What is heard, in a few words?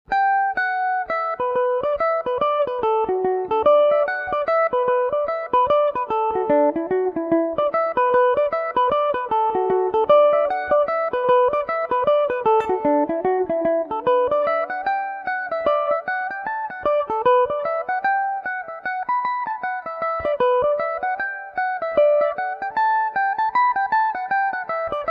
leitrim reel edor, mandolin